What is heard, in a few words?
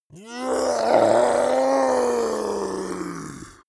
zombie human change